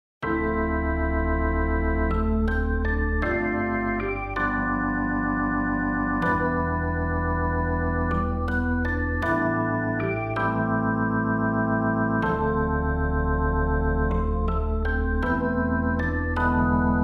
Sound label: karaoke